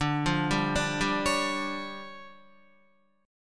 D Minor Major 7th Double Flat 5th D Guitar; 9,8,7,7,7,9; DmM7bb5 arpeggiated

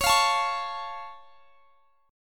C sharp Augmented down, guitar chord; strummed

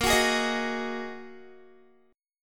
Bb 7th Suspended 4th Sharp 5th, Guitar; Bb7sus4#5 strummed; 18,21,18,20,18,x

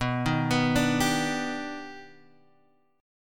D sharp Augmented Major 7th B

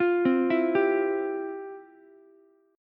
F Major 7th Suspended 2nd, Ukulele; arpeggiated; FM7sus2; JGuitar

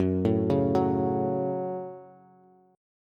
Gb Minor Gb Bass; arpeggiated; JGuitar

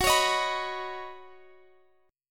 F sharp Suspended 6th; strummed

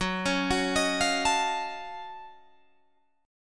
Gb Minor Major 13th Gb down Guitar DADGAD; arpeggiated

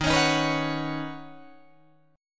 Eb Minor 7th Sharp 5th Eb Guitar Drop D

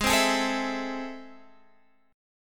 A Minor Major 7th, G sharp

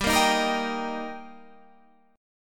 G Minor Sharp 5th G, Guitar, Standard; strummed; 15,13,13,15,16,15; JGuitar

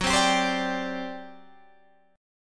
G Major 7th Suspended 4th F sharp

